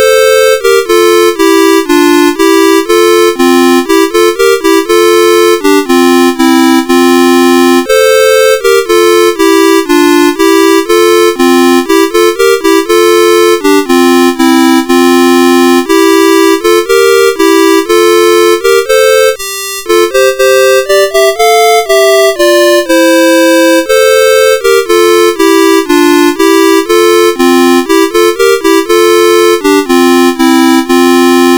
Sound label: Traditional Welsh Melody, CSG8580R5; Becky